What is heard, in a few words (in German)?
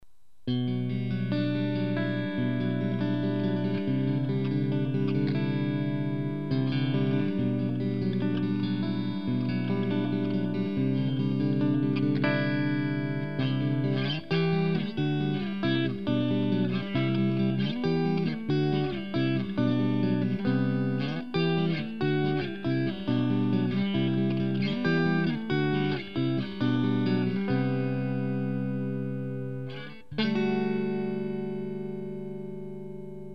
clean, gitarre